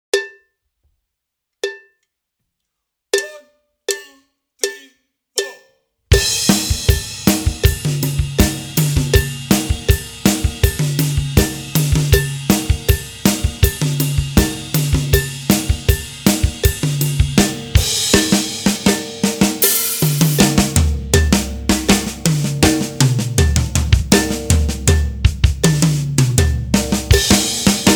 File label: Duett Drums 80BPM